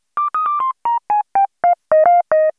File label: Extra Riff, electro lounge; Sonic Foundry (Sony Creative Software); 111 Hotty